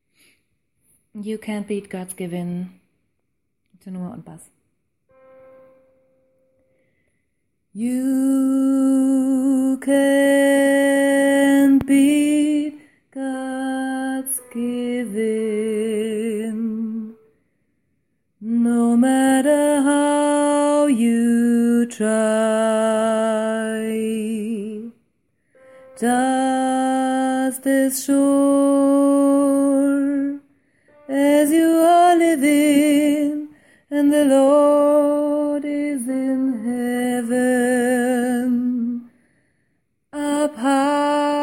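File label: Tenor Bass, gospel choir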